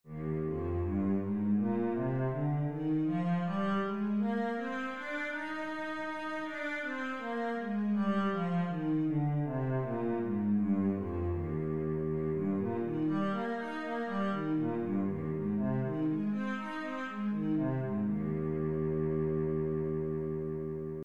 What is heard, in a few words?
e flat major, cello